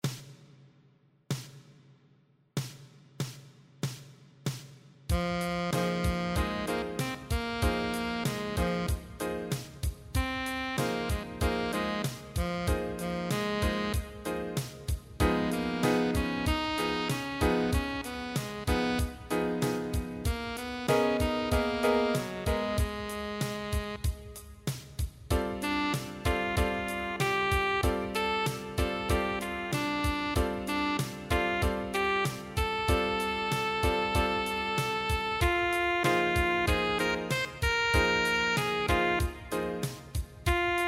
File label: PLAYALONG BASS